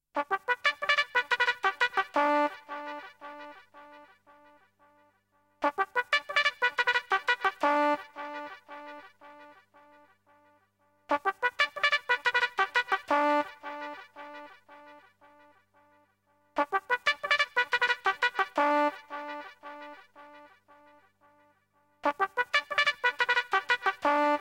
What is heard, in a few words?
Jingle